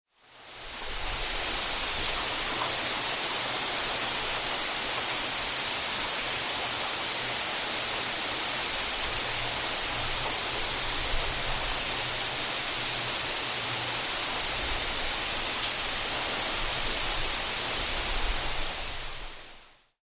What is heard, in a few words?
blue noise and rain